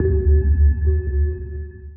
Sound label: sonar Tail Suit Far Shuttle Directional; Barotrauma; Real Sonar